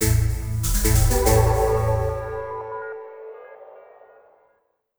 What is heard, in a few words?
New Item; Jak II; PS2